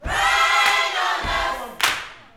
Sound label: Vocal Gospel PHRASE